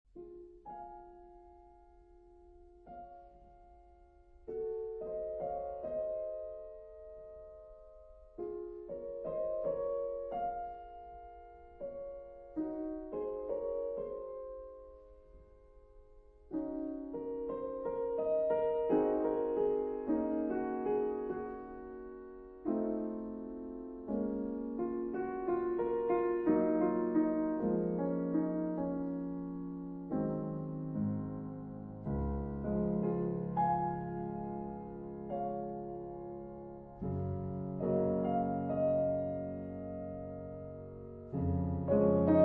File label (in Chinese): 管風琴; 法國路易15到路易菲力的耶誕音樂; HORTUS